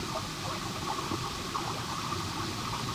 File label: Waterway A Dark